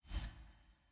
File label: ui button generic hover; dragon